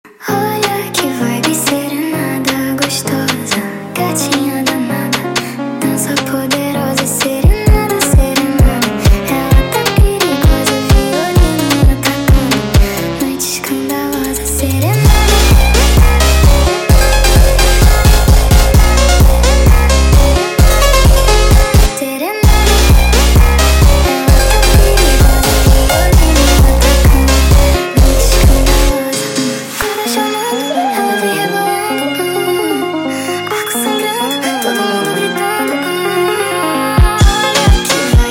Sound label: sped Up, funk